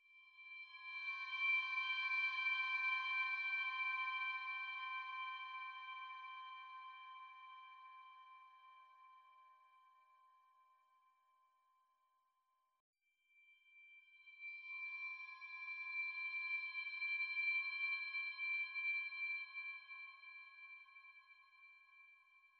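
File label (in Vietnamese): Âm thanh lo lắng và đáng sợ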